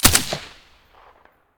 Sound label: scar silencer stereo